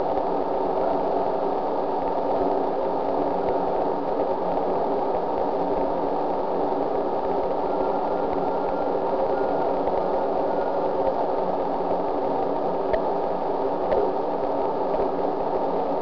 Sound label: PVC 52.5ft 6in dia, Water Leak Detection